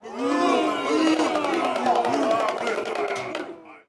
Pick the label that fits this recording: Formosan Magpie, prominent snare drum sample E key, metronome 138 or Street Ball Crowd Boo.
Street Ball Crowd Boo